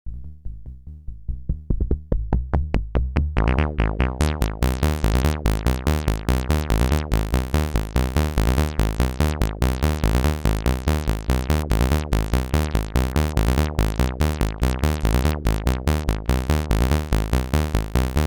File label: BASSLINE CV Cutoff